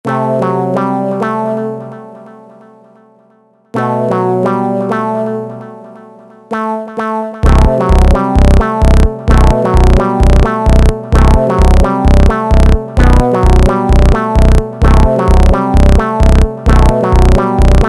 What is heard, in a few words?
funk